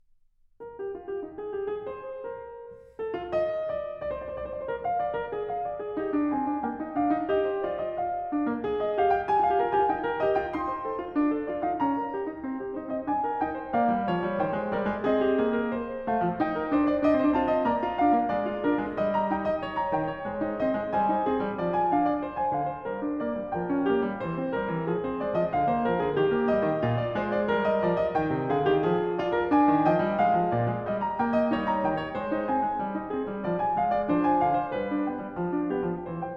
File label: Well-Tempered Clavier, Book 1 Fugue No. 7 in E-flat major; BWV 852; Bach